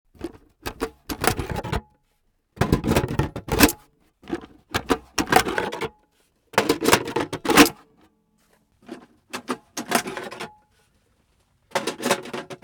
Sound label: checkprinter sounds; Protectograph